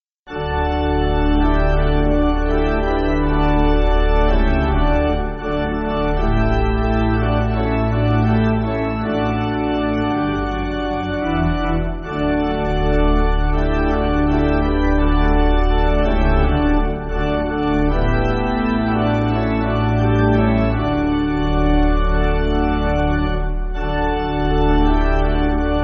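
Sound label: Pipe, Pipe Organ, Music Backing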